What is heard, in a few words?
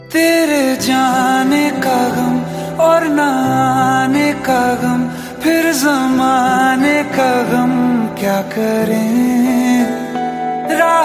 Song Ringtone